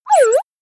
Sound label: notification; samsung Aleart